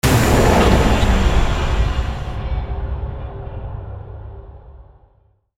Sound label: factory garbage